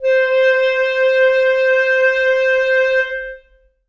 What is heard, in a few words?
reed acoustic, clarinet